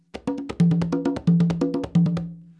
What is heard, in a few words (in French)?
roulement ternaire, congas